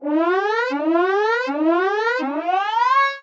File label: Alarm; Akuvox; Wideodomofony; Polskie komunikaty